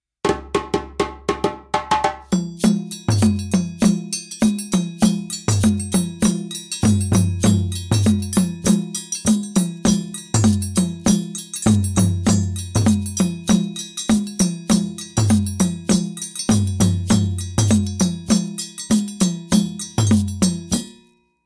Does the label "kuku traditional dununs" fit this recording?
Yes